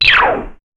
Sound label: retro style sci fi game s; video game